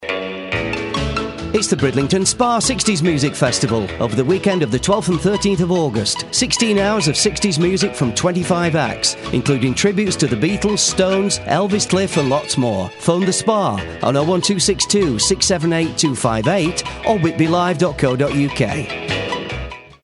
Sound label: sixties festival; ridlington